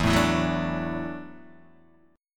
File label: E 9th Suspended 4th E down Guitar Open D